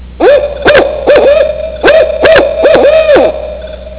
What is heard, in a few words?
Another Barred Owl Common